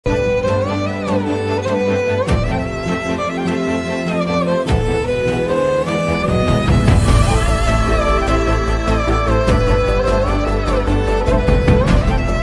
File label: trailer bgm ringtone; 777 charlie; rakshit shetty